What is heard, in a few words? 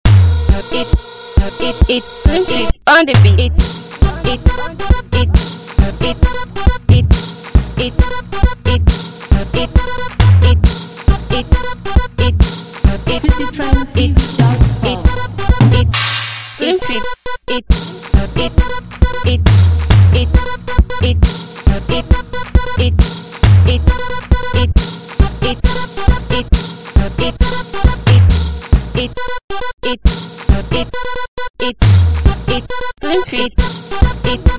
Instrumental